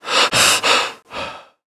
sprint breathing; Call of Duty Modern Warfare

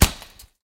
bullet dirt; guns; War Thunder

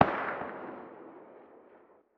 smg attack far distant; Rust

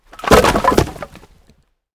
tank impact wood

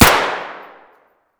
glock17 indoor distant; Escape from Tarkov

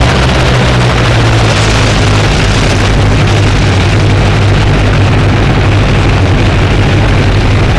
Plane Chute Rumble; DCS

